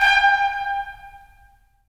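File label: brass trumpets staccato, right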